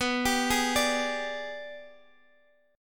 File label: G sharp Minor Major 7th Flat 5th B